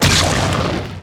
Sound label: Blood Splat Gib; Lucius III; pc linux